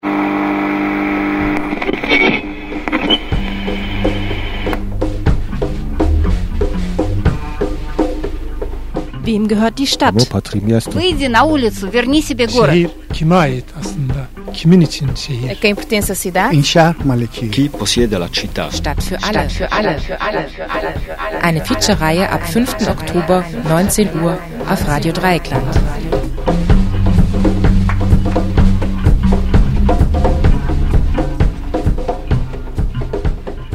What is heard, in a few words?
JINGLE